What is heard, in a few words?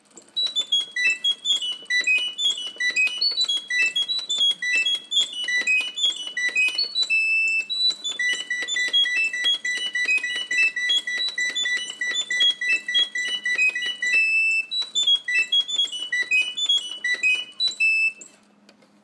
serinette